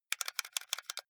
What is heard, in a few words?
console controller xbox play short fast